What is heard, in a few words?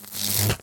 Electrik Spark